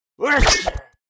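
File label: war attack, Mob; vaffernipper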